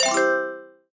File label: win ending; Super For